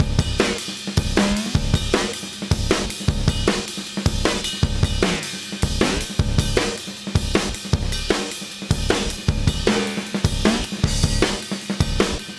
fx 155, Beat Loops; Book em; Zero-G - Monster Beats

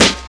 GRIME SNARE; Drums In Your FACE!

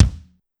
Kick, Pitched Up; Clavia DDrum-AT

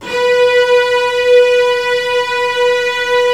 STR SEC, STRINGS; Roland - LA Composer vol. 1

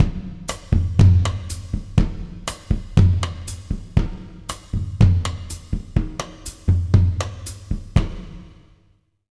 120 W side, jazz latin drumloops

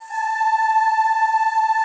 ZZZ A4, Classical Choir; Best Service; Extended Classical Choir

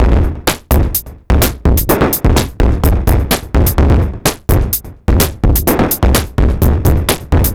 Subtractive Beat

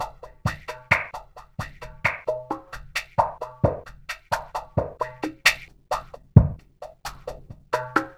PERC, LOOPS; Spectrasonics; Metamorphosis

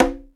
CONGA, PERCUSSION; Crypton - DrumBase5000

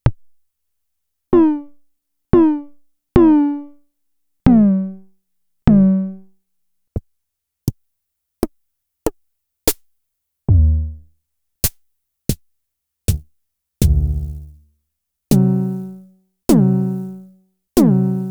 Fricke Schlagzwerg Toms

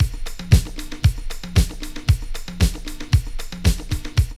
DISCO LOOP; Zero-G; Funky Elements

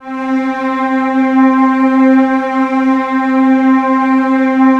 C3, Strings; ILIO; Kirk Hunter Virtuoso Strings VOL-3; STR TAME

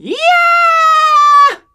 SCREAM; AKAI S-Series CD-ROM Sound Library VOL-6; SCREAMING

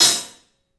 HAT, CLUBHAT, 3D Drums; 3D Sound

